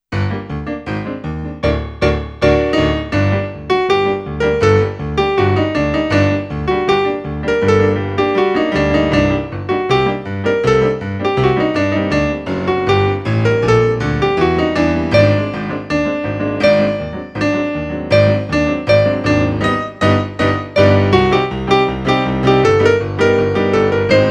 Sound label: melody